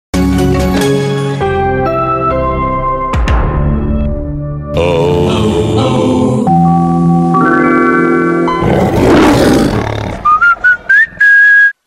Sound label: POWERED SONIC TRIGGERS